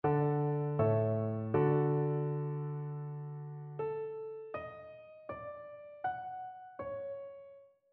melodic fragments; ear training

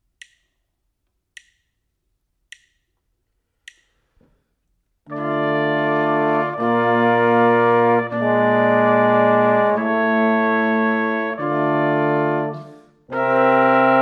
harmonic error detection; eartraining

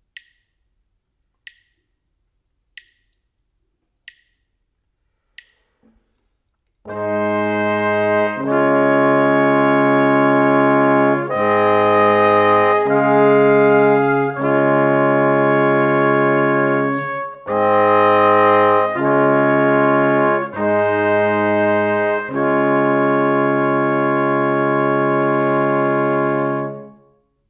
harmonic dictation

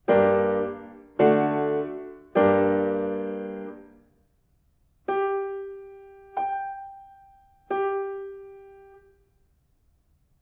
diatonic collections

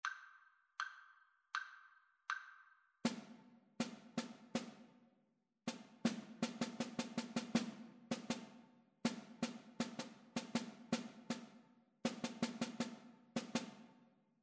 rhythmic dictation

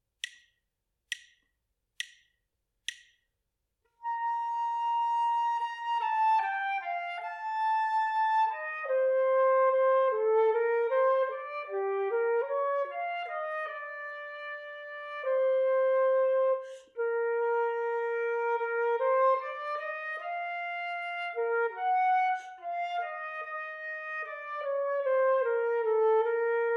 melodic error detection; ear training

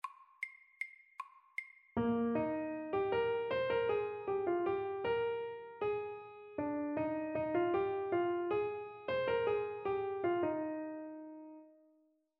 rhythmic dictation

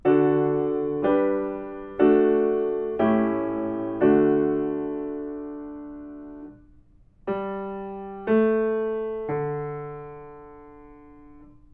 melodic fragments

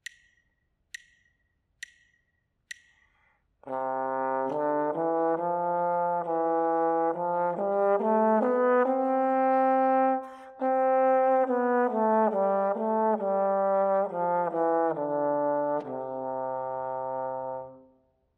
melodic dictation; eartraining

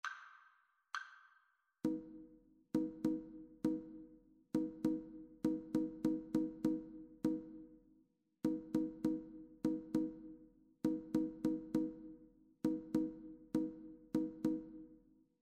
rhythmic dictation; ear training

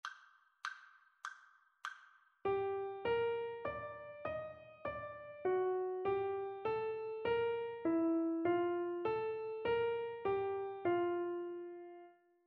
partially completed melodic dictation

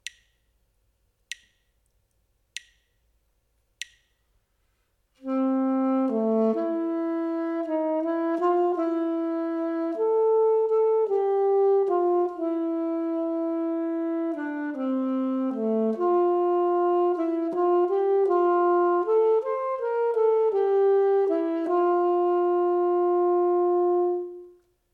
melodic dictation

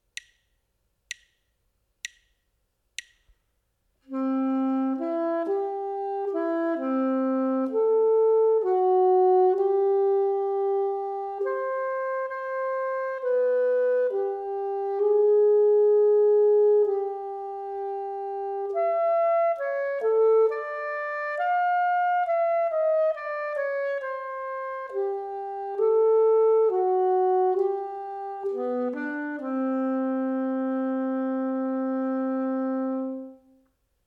melodic dictation; eartraining

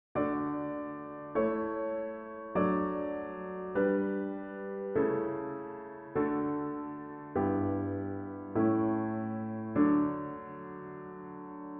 harmonic error detection

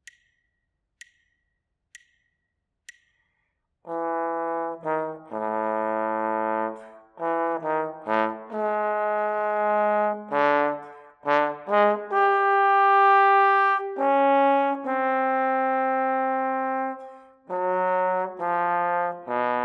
melodic dictation; ear training

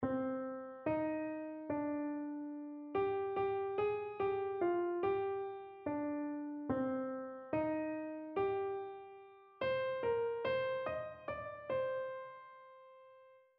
melodic error detection; ear training

